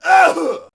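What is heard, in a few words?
Settler Male Die Vox; Civ4 warlords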